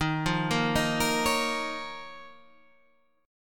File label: F 7th Suspended 4th Sharp 5th Eb